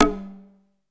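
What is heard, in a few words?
PERC 3 G2, percussion; SEQ AND PERC; Music Store - Claudius Diemeter Schwerlos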